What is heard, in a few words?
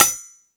FX Sounds, Drum Machines E-MU Pro-Cussion